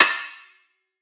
perc swirl, Drum One Shots; SD MIDNIGHT Sample Pack; Slate

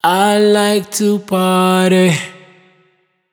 hook Party 100 Abm wet, Vocal; Slate Digital Omnivox Vocal Sample Pack

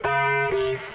Vocal one shot Ab

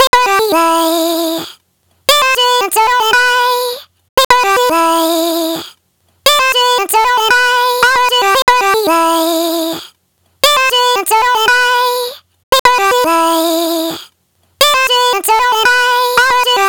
Omnivox Vocal chop Mood 115 Em dry; Chops; Slate Digital Omnivox Vocal Sample Pack

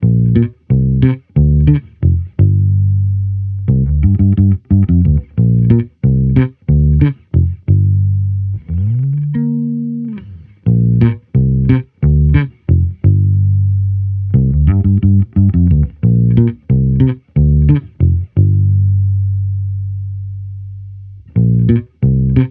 Amped loop 92 Gm, Electric Bass Loops; Slate Digital BASSHOLE Sample Pack

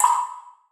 percussion oneshot jack; Pop; Drums; SD PASADENA Pop Sample Pack; Slate

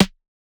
perc pinch; Hip-Hop; Drum One Shots; SD CAICOS Hip-Hop Sample Pack; Slate

